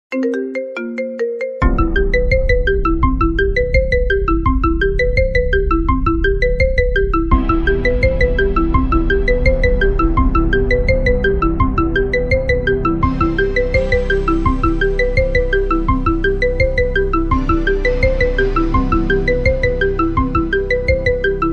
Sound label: Marimba Remix Marimba Ringtone; Stranger Things